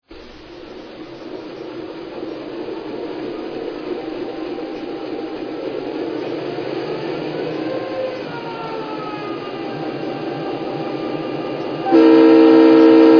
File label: SD9